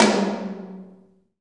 TOM TOM, Roland

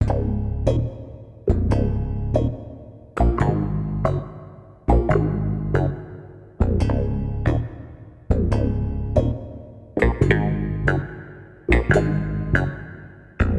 Synth Guitar; Sonic Foundry (Sony Creative Software); Mac Money; Guitars

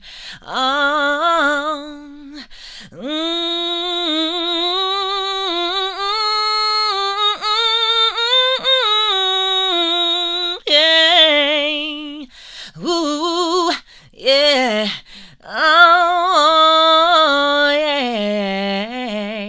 FEM VOX, Female Vocals; PlatinumPhattCD2; E-MU Producer Series Vol. 8 – Platinum Phatt

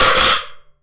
JUNK, Anime Sound FX; COMIC

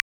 Dry click, Univox SR-95

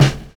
VINYL SD, Drums Dance & Groove

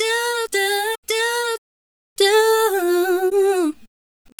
Female Effected, Effected Vocals; Hip-Hop and RnB Vocals

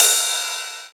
Ride Cymbal

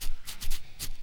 AFRO RUB, Vocal Planet, Blues Soul; Spectrasonics

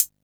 Hat, Drum & Bass

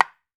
rosewood, African drums; E-MU Formula 4000 Series Vol. 4; Earth Tones

